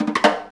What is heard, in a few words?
Beguine, Percussion; Roland BK-5